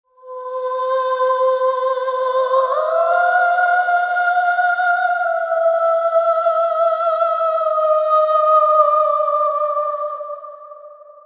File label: scary ghostly woman singing horror sound